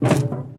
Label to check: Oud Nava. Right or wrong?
Wrong